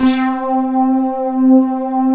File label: PAN TONES; Pro-Rec - Synthsonic; TRINITY